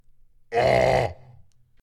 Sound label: Pain Scream Hard